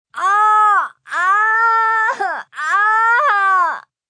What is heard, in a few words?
Pain Crying Out, Pain, agony, female, crying out in pain, moan, groan; human voice; Sound Effects:Voice; VoiceBox